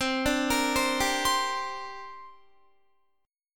C 7th Suspended 2nd, Guitar; C7sus2 arpeggiated

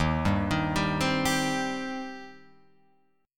D sharp 6th, D sharp, down, Guitar, Open F; arpeggiated; JGuitar

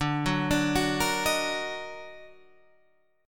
Eb Minor Major 7th D, chord

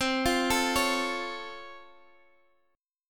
C sharp Augmented Major 7th C, chord

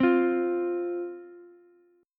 F 5th F Ukulele; strummed; chord; JGuitar

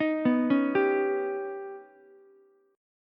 D sharp Augmented 7th D sharp, Ukulele; arpeggiated; JGuitar

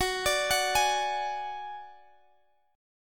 Gb Suspended 2nd Sharp 5th, Guitar Standard; Gbsus2#5 arpeggiated; x,x,16,19,19,16

